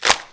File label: neck snap; Gish